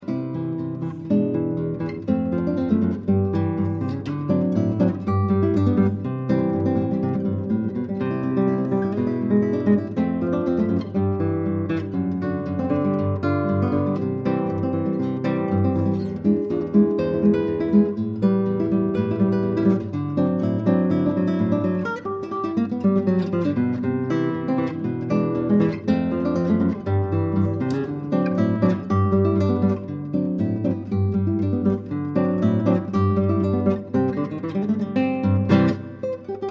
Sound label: Victory Rag